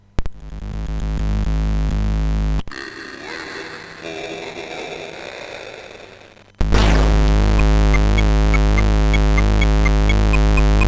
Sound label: MOS 6581R3; SIDBench